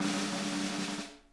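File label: snare roll; Sonic Reality; Interactive Drum Kits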